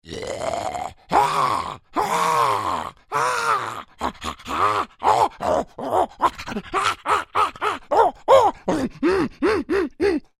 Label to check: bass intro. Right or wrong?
Wrong